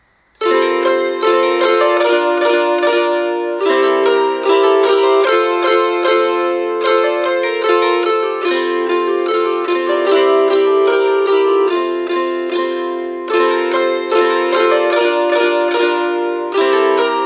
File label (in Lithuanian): kankliuojama plastikiniu brauktuku; Trepsiukas